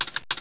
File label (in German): Tippen; RPG Maker; Wartools v1.14